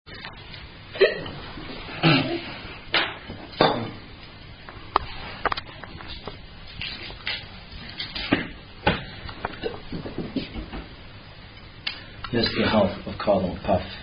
South African Variant Version Of Are You A Cardinal Drinking Game; Cardinal Dave